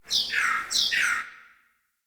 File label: Cardinal Decoy Call; Objects; Thawtide